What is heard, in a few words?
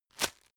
tissue grab hard; objekte; taschentuch